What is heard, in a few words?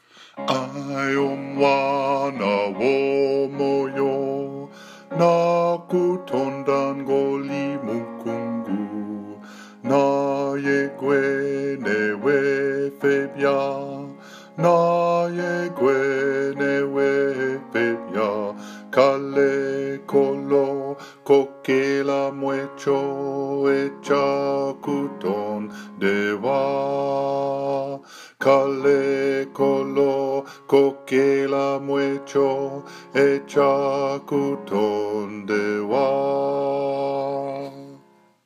bass